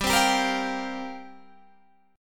F Suspended 2nd Suspended 4th G

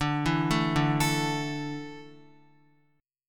D Suspended 2nd D, down, Guitar; arpeggiated; 4F,4A,5C,5G,5C,6E

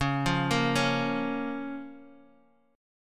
F Suspended 4th Sharp 5th Db

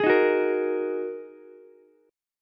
G sharp 7th Suspended 2nd G sharp, down, Ukulele; strummed; chord

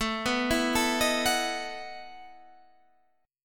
A 6th Suspended 2nd Sharp 11th, guitar

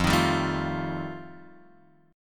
F 9th F Guitar Open F, chord; strummed; JGuitar

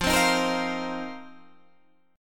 A sharp Suspended 2nd Suspended 4th

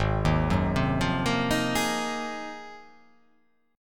Ab 13th Ab down, guitar, Ab13 chord; arpeggiated; JGuitar

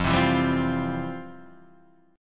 F Major 7th Suspended 2nd, Guitar, Drop D; 3,3,2,0,1,1; down; chord shape

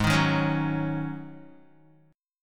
G sharp 7th Suspended 4th G sharp, Guitar; G#7sus4; 3,6,3,6,6,x; strummed

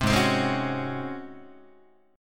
G sharp 11th G sharp, down, Guitar; strummed; JGuitar

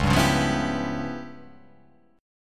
C sharp 7th Sharp 9th Flat 5th, chord

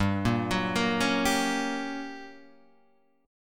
G Minor Major 7th Flat 5th, G; GmM7b5 Chord {3 1 4 3 2 3} arpeggiated

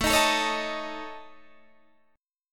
A Major Flat 5th, Guitar DADGAD; chord

